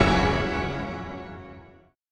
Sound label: perc